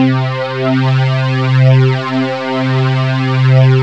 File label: SUPER SYNTH; Rubber Chicken Software Sample Bank